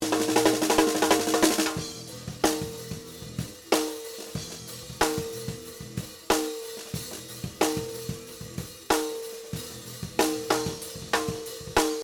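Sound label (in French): snare, le micro Caisse-Claire dessus avec le micro dessous rien n'est encore traîté; batterie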